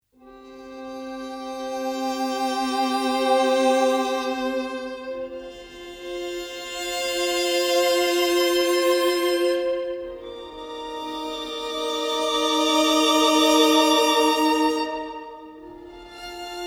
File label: violin expr